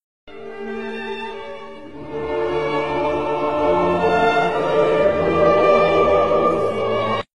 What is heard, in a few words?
dramatic